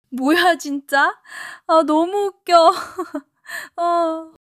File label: laughter; monologue